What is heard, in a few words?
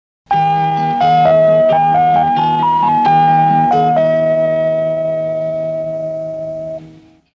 Radio Exterior Espana IS